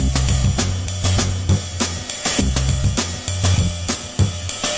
suspense bass vinyl, drum loops and breaks, 100 bpm; 091 to 100 bpm